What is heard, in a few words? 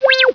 BOUNCE, HELICOP